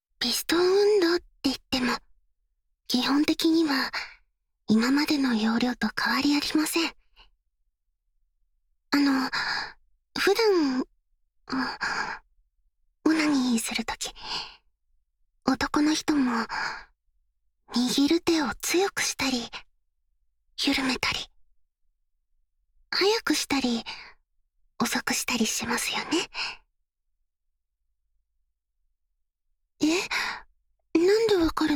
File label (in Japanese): 中級者編～ピストン～; 24bit